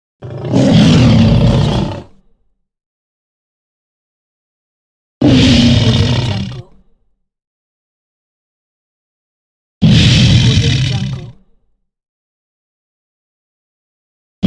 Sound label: lion roaring; wistansound